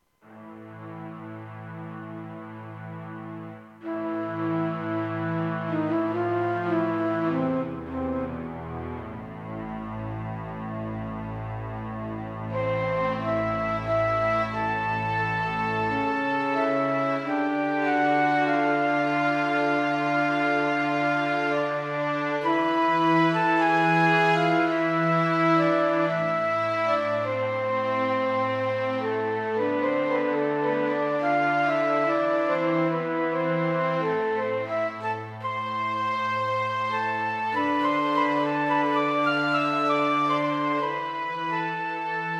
casio xw-p1 hex layer

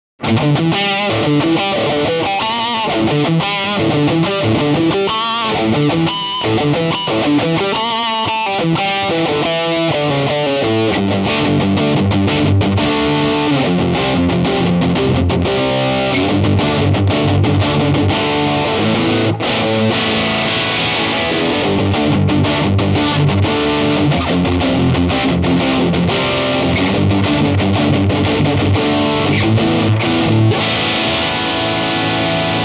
higain; AX3TBL higain; Music Man Axis

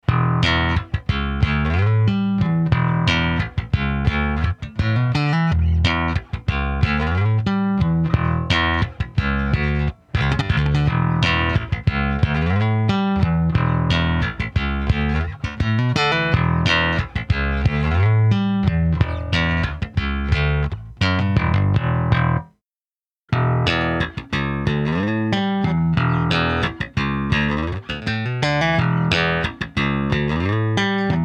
jbf-1000 slap; Modern Jazz Bass

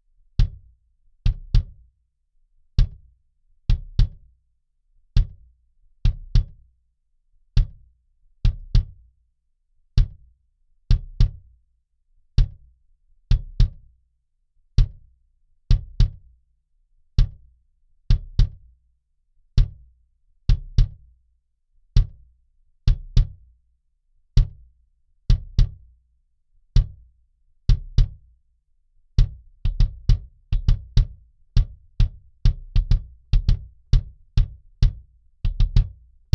beta52a clean

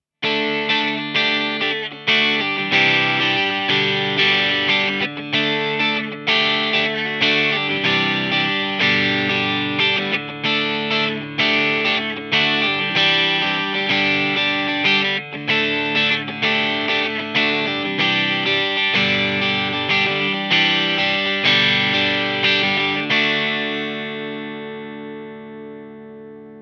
low gain; T-REX Crunchy Frog low gain

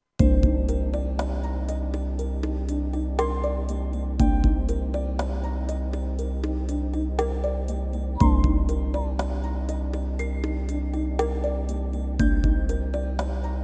casio xw-p1 performance

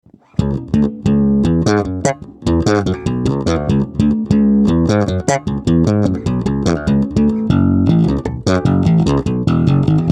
neck + bridge; Fernandes A4X

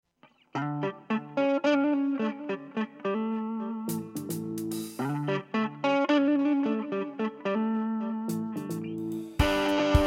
swing demo song; jeff watson

